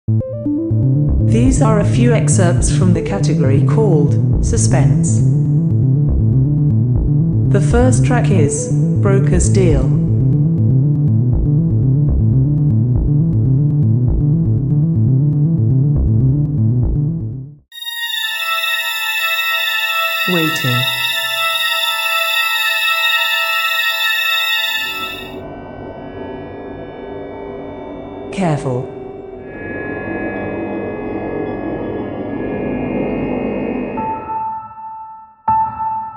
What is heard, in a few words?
mystery suspense; luca visual fx